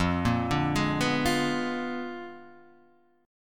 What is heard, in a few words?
A sharp 7th Flat 9th F